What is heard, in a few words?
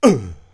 jump